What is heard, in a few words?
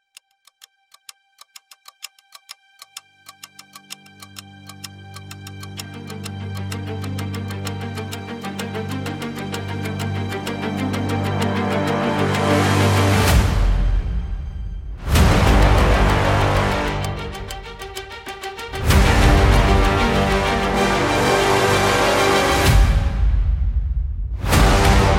trombone lead